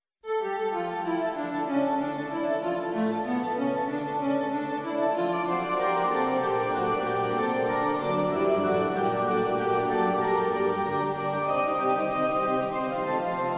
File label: new fugue